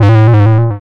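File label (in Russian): эйсид хаус долгий монотонный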